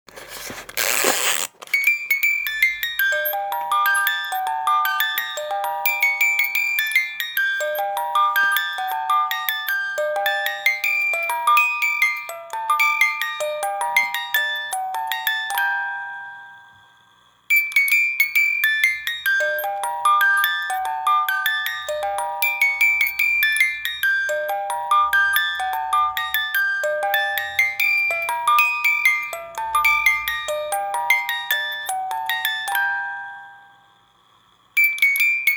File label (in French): Boite a musique Lettre a Elise